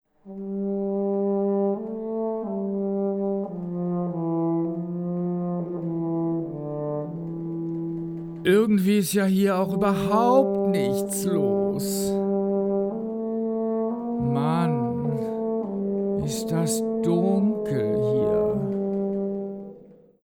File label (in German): Gaehnen